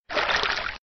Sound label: Water